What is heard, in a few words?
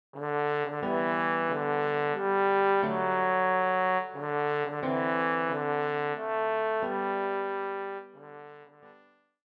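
trombone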